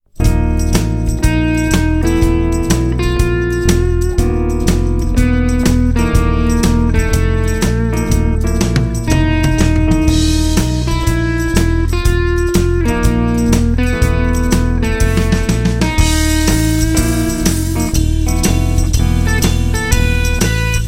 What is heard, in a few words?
two semitone tritone